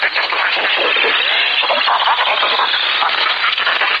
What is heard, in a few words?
computer crazy